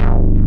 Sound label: SHARP BASS; Pro-Rec - Synthsonic; BASSES